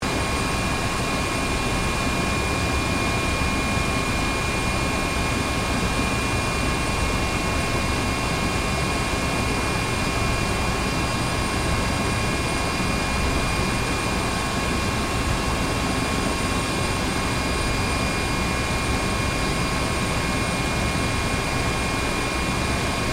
Helicopter Idle, Aircraft, Helicopter, Idle, Interior, Perspective, Hh-65, Dolphin, Loop; Transportation; Helicopter Sound Effects; BlastWave